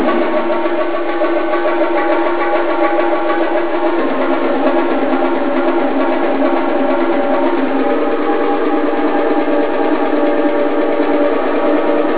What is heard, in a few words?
Percussionist; Tokyo Concert